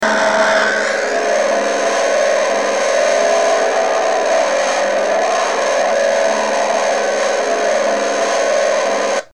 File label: light plane